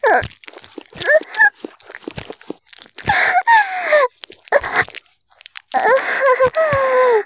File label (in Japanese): 絶頂 中出し; 絶頂+潮吹き+中出し ランダム再生